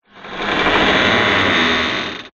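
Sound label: creepy door creaks open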